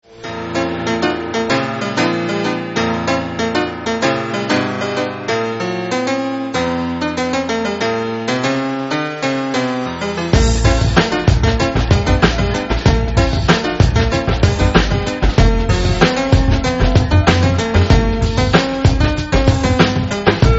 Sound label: piano